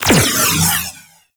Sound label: Space Fighter Railgun Fire; Warframe; RailJack; Corpus; Space Fighter Charge Agent; Weapons